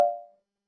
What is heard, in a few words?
error tone; feedback